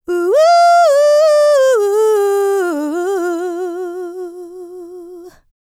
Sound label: LICKS, Vocal, R&B; ILIO; Dance; LT R+B